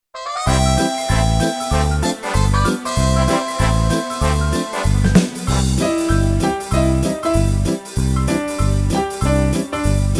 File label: QuickStep